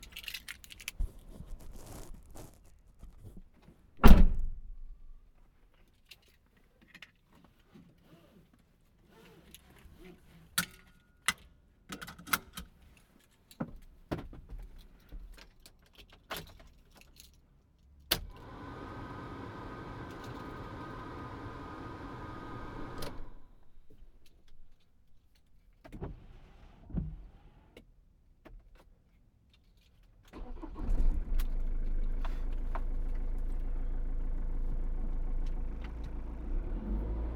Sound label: Ford Transit Door Start